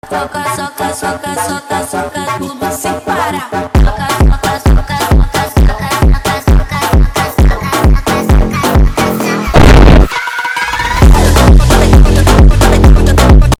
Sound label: danca phonk brazilian phonk